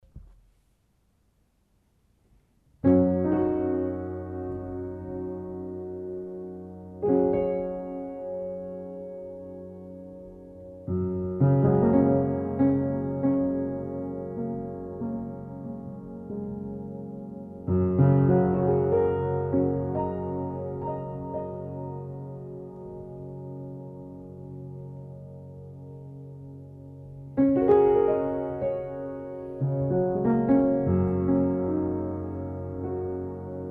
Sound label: Piano Music; Brain Buttons